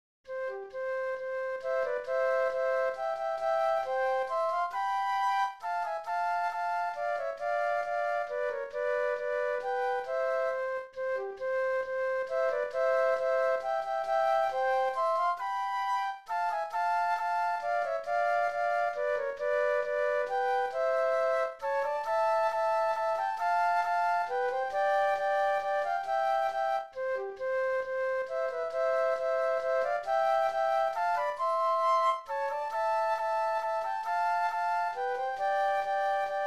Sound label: Waltz Flute Duet; classical; Schubert; op. 77 no. 1